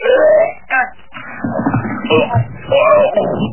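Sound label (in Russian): рвотный позыв мужской с резкой рвотой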